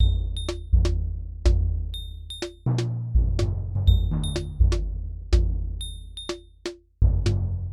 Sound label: nord drum 2